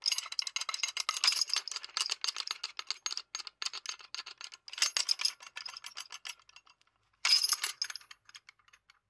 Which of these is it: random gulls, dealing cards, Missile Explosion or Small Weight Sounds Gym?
Small Weight Sounds Gym